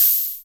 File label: HH, HH Synth